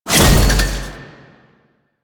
Void Dragon grapple connect; Enemies; Warframe; Duviri

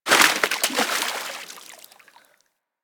Forest Fish Jump Water; Ambience; Props; Warframe; Grineer Forest

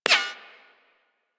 Bullet Impact Ricochet; Warframe; Weapons

